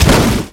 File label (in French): Atterissage; Flatout 4 Total Insanity